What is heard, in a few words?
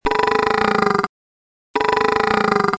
fallow buck double grunt